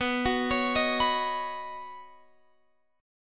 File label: B Major Add 9th, Guitar, Open F; arpeggiated; JGuitar